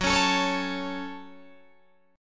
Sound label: Gb Minor, Guitar, DADGAD; Gbm strummed; 16,16,19,18,16,19